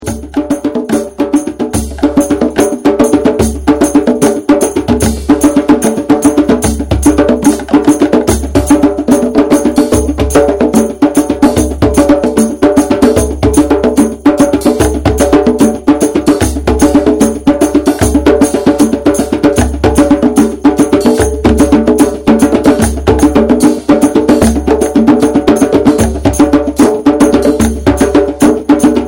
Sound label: Fighting War groove